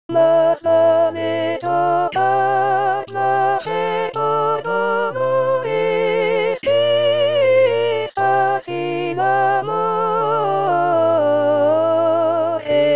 voz, con voz; masvaletrocar; Coro "Con dos Bemoles"

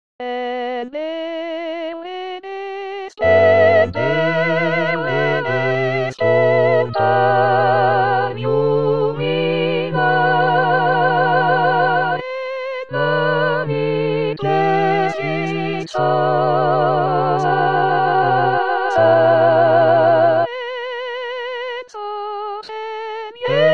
TODOS voz; Coro "Con dos Bemoles"; SATB

